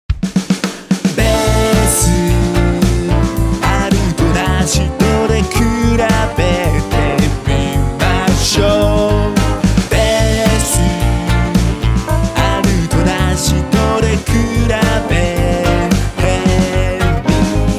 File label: bass